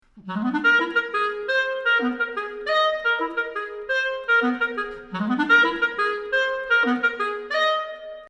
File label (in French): clarinette